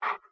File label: Wolf Huff; Wolf Link